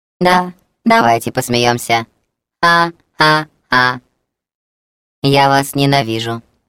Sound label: laugh; GLaDOS; taunt